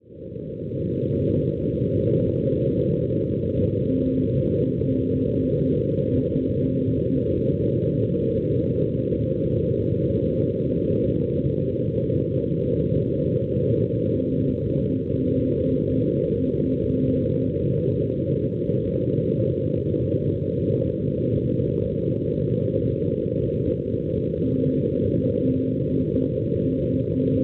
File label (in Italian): NDB, Tolosa Francia 332 kHz; TLF; Schwarzsee